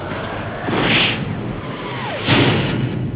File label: warp